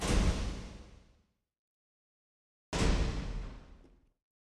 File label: snap shut